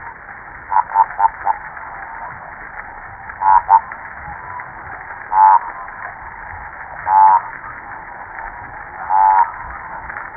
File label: Unknown sp Kwa Chorus